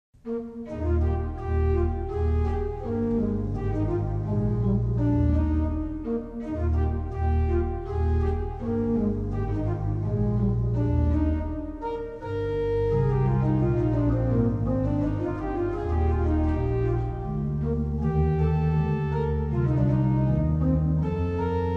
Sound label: Bach Choral Wachet auf, ruft uns die Stimme